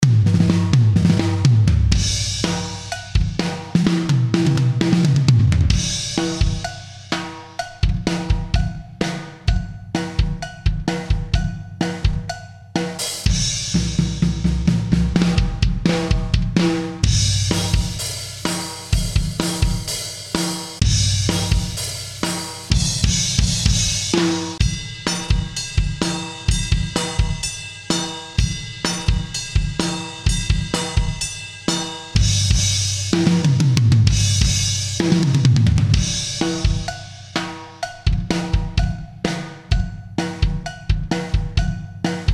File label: multitrack rock drum loops